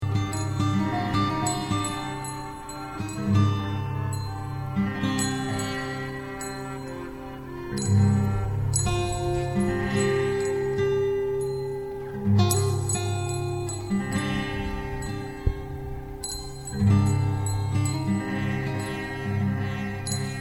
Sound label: british primitive music